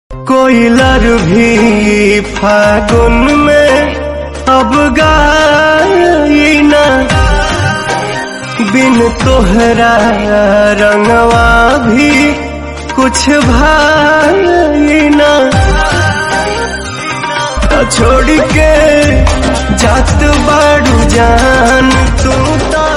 Bhojpuri Bewafai Sad

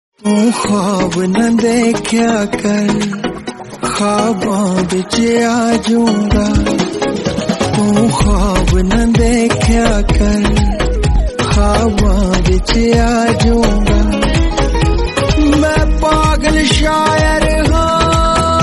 punjabi song

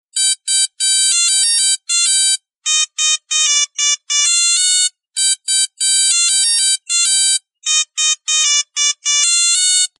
pager no background noise; gta iv

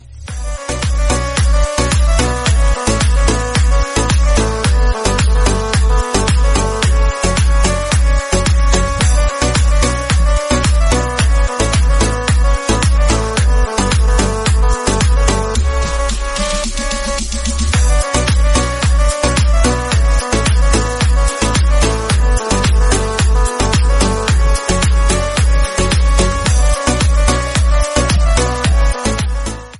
turkish instrumental

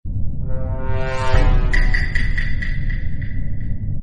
message tone; amoung us imposter

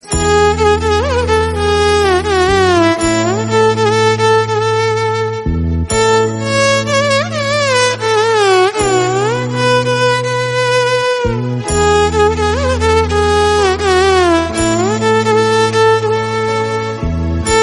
violin instrumental bgm

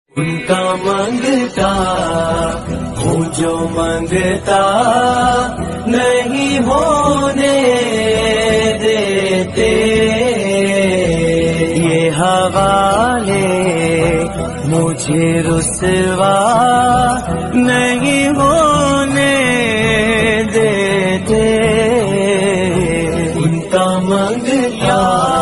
naat sharif islamic

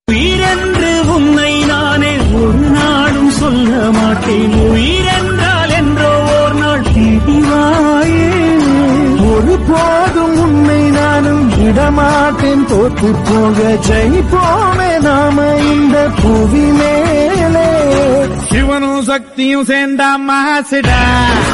father daughter song tamil

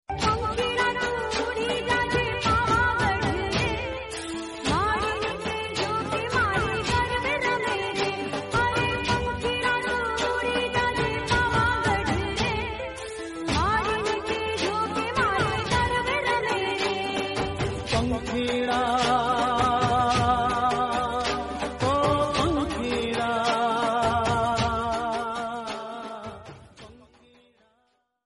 garba song gujrati

